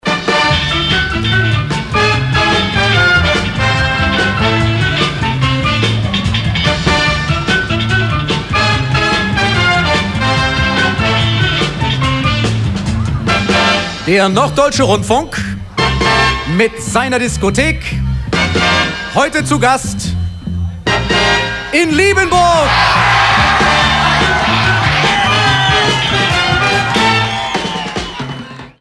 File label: ndr disco opener